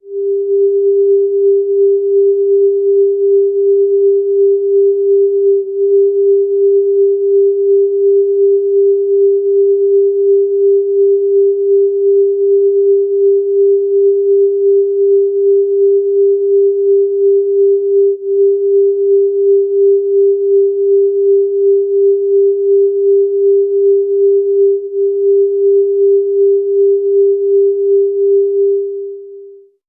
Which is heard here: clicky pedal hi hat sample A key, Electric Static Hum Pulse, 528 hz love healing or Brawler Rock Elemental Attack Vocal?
528 hz love healing